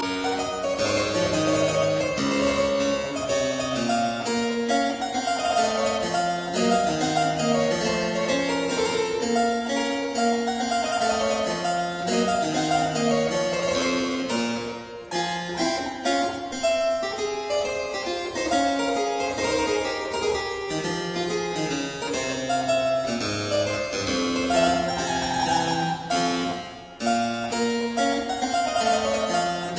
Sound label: Suite in B minor Rondeau; Draghi; Arthur Haas